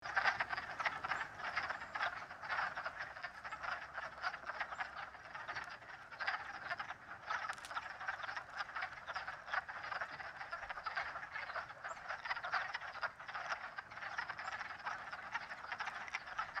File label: wod frogs